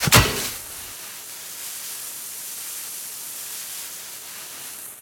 Miner Bomb Place

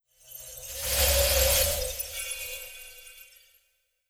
Magic Spell

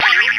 flick response

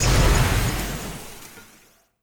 Hawk Fire Burst

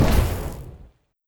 Impact Fire

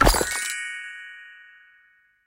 Animations Repair Common Old

